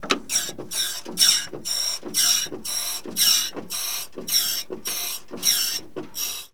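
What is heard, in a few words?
Plane P40 Lever Emergency Pump; Airplanes; SFX Kit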